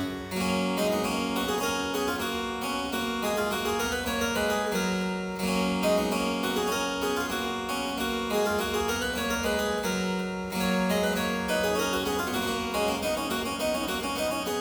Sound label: Suite No.3 in G minor, Gavotte II, Keyboard Works; J.S. Bach; English Suites; BWV 808